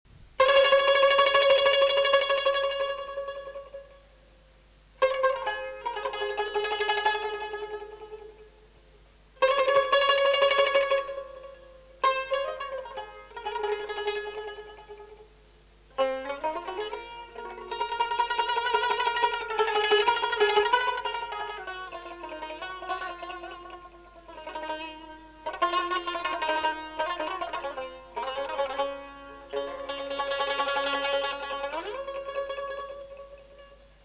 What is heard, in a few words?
tar